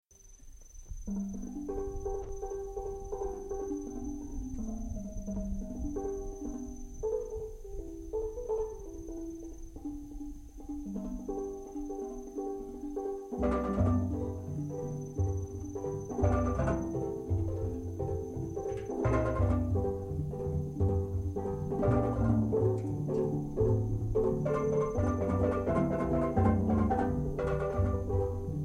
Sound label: steel band clash